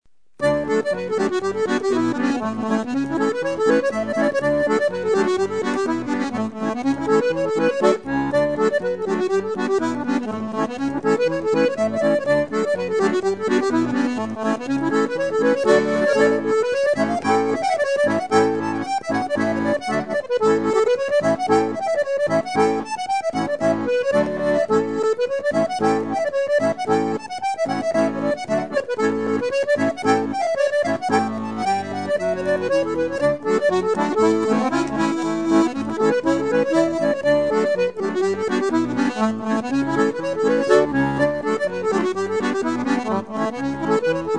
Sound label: scottish reel, akkordeonist